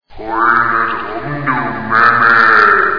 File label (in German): aua; Source Engine